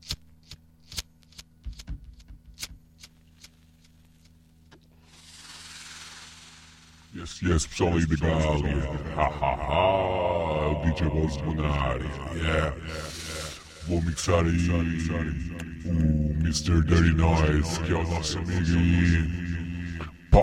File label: Dirty Noise